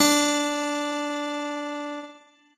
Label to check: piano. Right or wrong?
Right